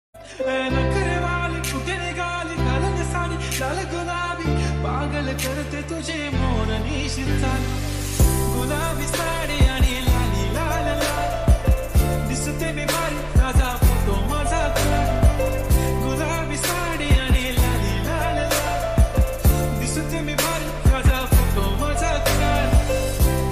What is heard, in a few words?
marathi ringtone bgm ringtone song